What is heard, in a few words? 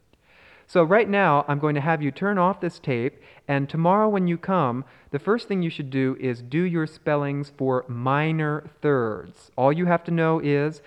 Minor Thirds Intro